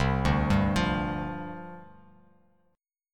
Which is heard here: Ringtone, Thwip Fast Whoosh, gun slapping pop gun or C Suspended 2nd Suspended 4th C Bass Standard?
C Suspended 2nd Suspended 4th C Bass Standard